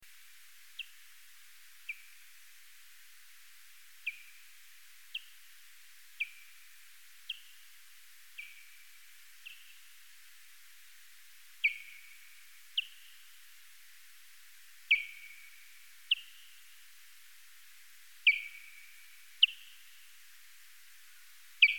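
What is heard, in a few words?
Chalinolobus dwyeri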